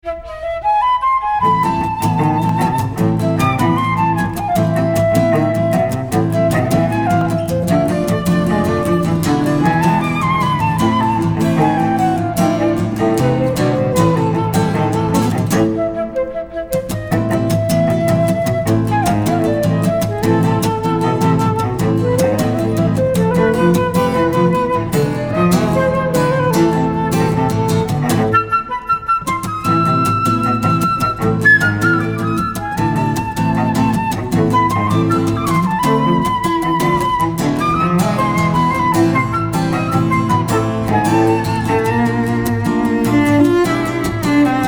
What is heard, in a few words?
Flute Guitar Cello